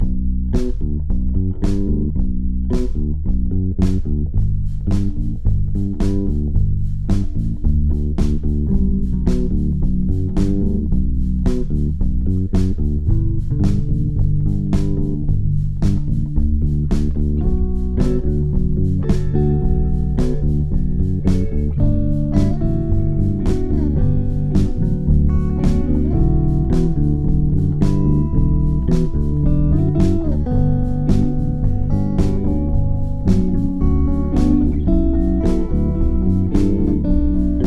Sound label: beats